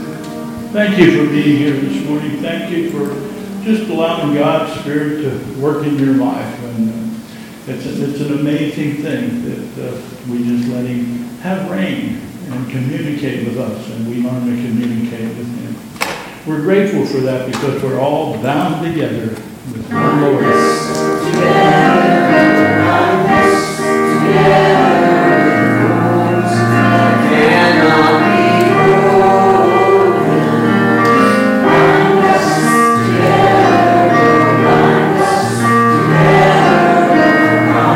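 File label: Benediction and Closing Chorus, church service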